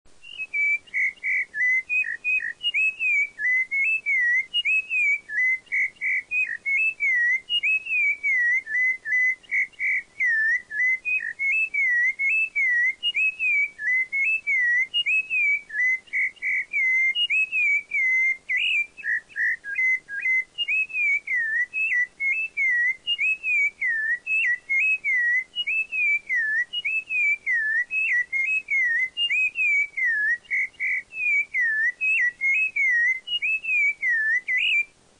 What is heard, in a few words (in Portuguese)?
laranjeira classico, cantos sabias